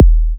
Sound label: Minimal Kick, Drums, Kicks